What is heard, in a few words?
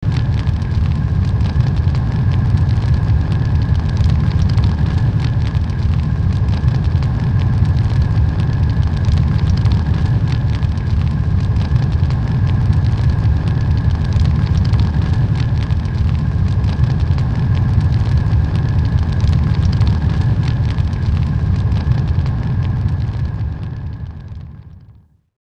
Sizzling Heat Lava Ambience; The Legend of Mata Nui; Game Music; Console Games